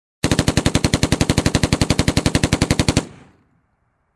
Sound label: Heavy machine gun firing long